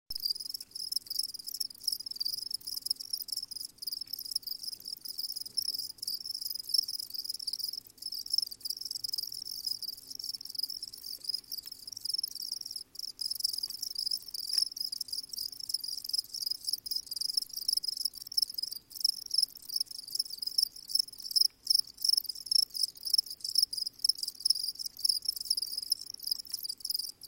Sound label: cri cri cri